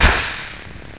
poof; Word Wizard Games

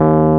Wurlitzer Med D#2

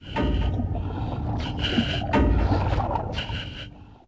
Abyss Exclamation

